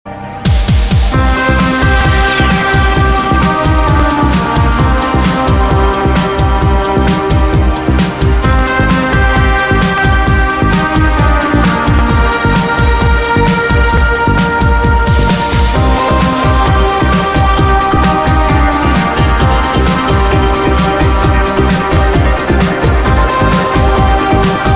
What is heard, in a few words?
Inst